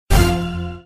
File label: wild stop; Criss Cross 81; wazdan